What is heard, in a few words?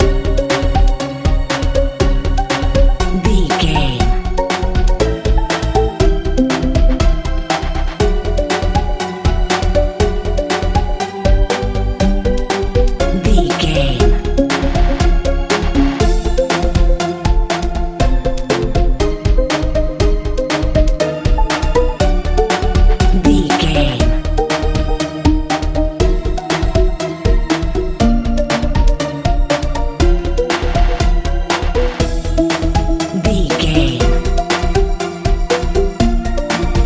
fun nice k pop